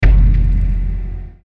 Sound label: Hit Low Deep, Hit Low Deep Clean; Production Element Imaging Element Accent Transition; Bass Button; Bass Buttons; Blastwave